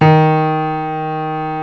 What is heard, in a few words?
BOSENDOR D#3; East West - Global Sounds